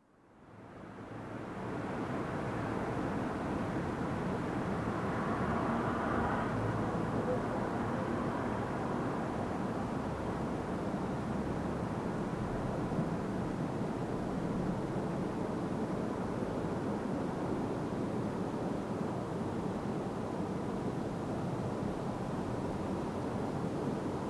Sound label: Night traffic with vehicles passing close and mid distance; Outdoor; BBC